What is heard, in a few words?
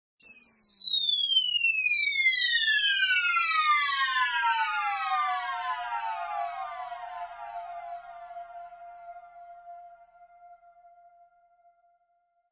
Spr Hgh Dive, Super high diving sound.; Rises and Dives; Sound Design